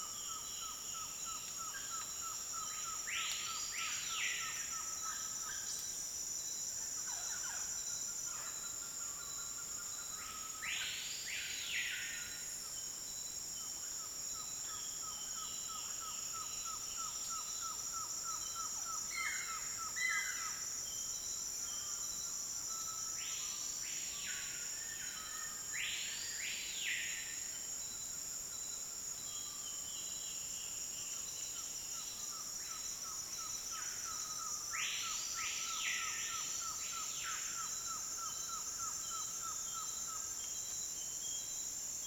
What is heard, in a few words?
Tropical dawn chorus Peru